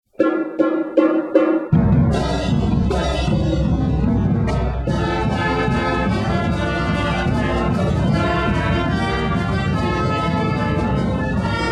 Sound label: warrior fight song band